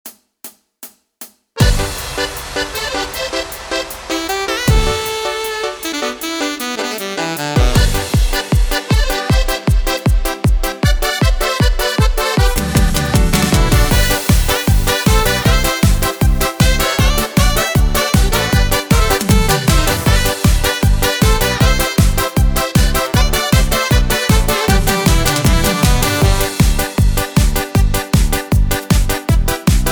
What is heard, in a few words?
Midi Yamaha; yamaha genos 2